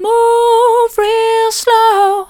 move real slow soft, vocal

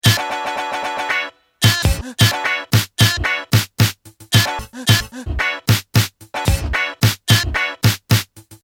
loop mono

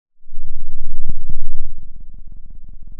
pop

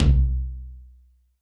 Tom, drum

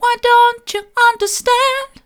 why don't you understand mid, vocal

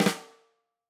Tam Flam, drum

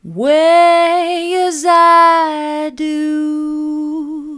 mid, vocal

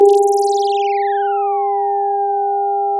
pop, Sound of a phone ringing

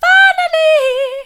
finally soft, vocal

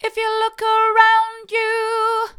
hard, vocal

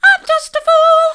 i'm just a fool soft, vocal

